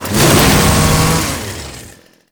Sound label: Saw Melee Swing; weapons; Warframe; Grn Ghoul Saw Player; Kuva Ghoul Saw